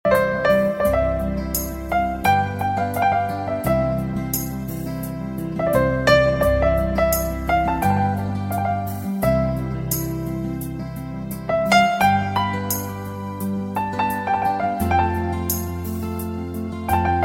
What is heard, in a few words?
Soft Music